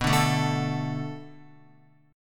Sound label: B Minor 7th B Guitar, chord; Bm7; strummed; JGuitar